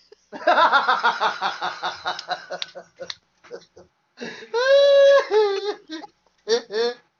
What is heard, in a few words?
laugh